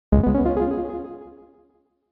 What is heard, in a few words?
level complete; baba is you